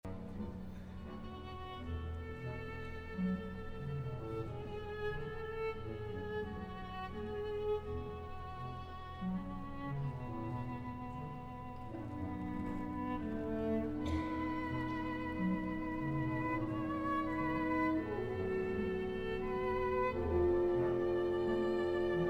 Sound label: Concerto for Viola and Orchestra